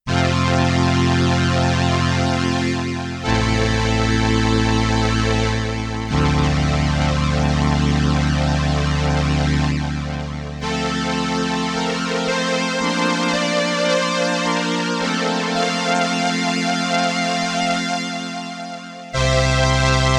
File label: STRINGS I with vibrato, sustain, and Ensemble I, Roland RS-202